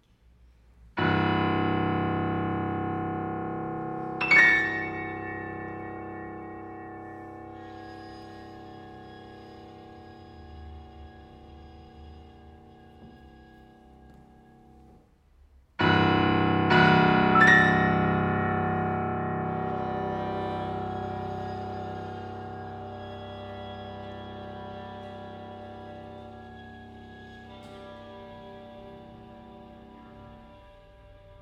flute, violin and piano; Utopia

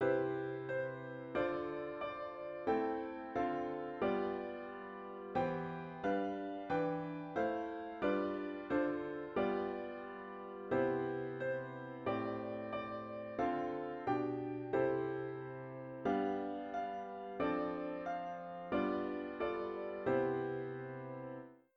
Harm fixed jazz

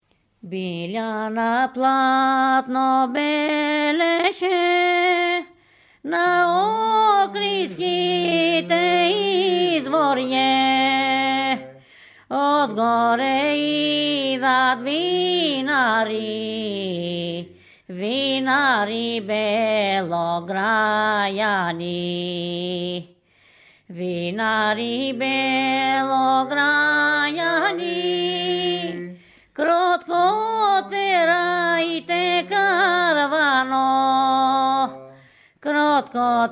urban melody

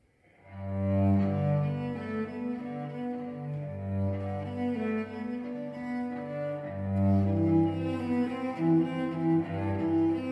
Cello Solo; Anner Bylsma; Bach; Nr.1, BWV 1007

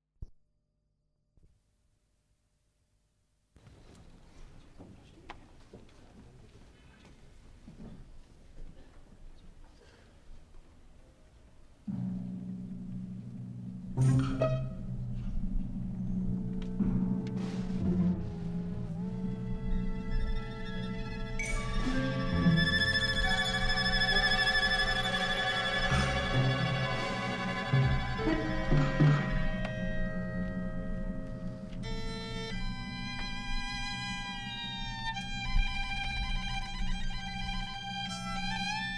violin concerto

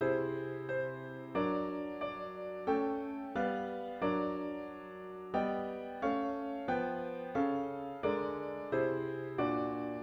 Harm fixed wholetone

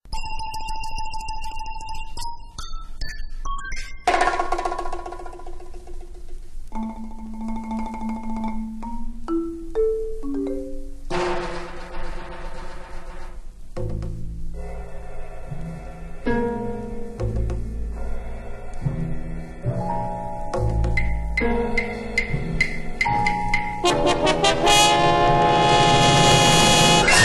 Misirlou, Orchestra and Chorus

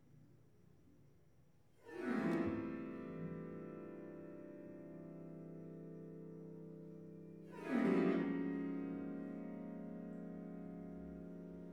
playing with the piano

